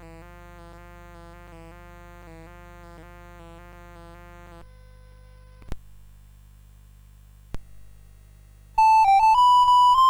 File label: CSG8580; Noel des Oiseaux